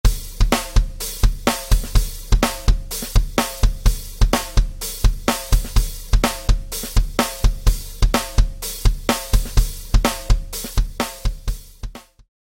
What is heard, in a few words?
Mapex Black Panther with Sticks